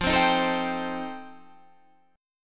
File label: F 9th F Guitar Half Step Down, chord